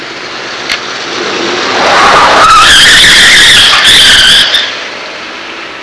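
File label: peel out, Transport; Sound Library 2000; Wayzata Technology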